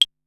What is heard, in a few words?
Claves High, Korg Wavestation-SR, Drum Kit; Wave 455 - Drum Kit